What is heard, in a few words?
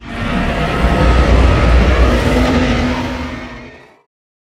dinosaur roars long